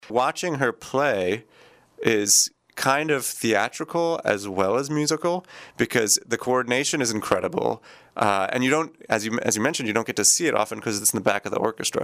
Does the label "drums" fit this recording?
Yes